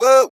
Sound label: Vox